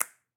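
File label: Finger Snip Double; Latin Percussion; Drums; Drum Hits; Ableton; Factory Packs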